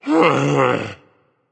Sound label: breath berserk; cuchulainn